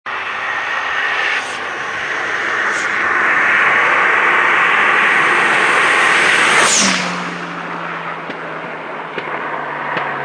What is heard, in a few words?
passat turbo